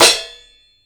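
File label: tight choke; Sampleheads; New York City Drumworks VOL-1; KD KITS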